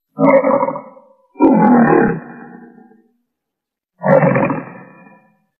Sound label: roar